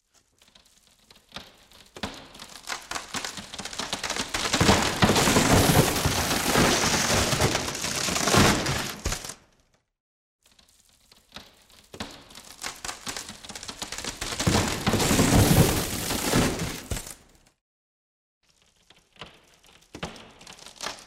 Plywood comes off

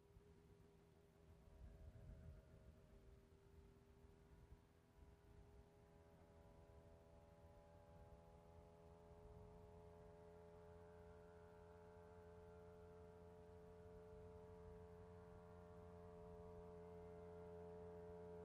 Motorcycle approaching, turning off

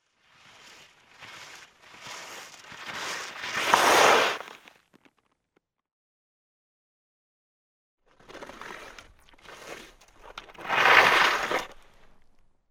Skiing, travel and stop, snow

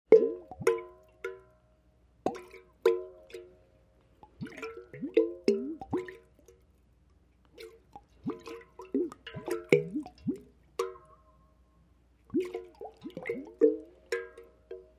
Water bubbling

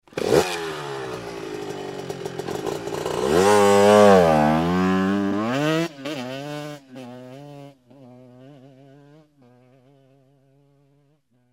Motorcycle starts, waits, drives away

